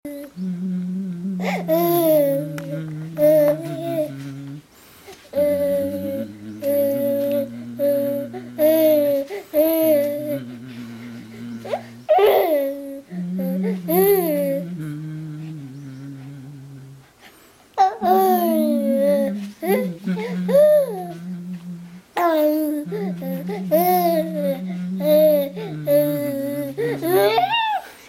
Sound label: singing with grandpa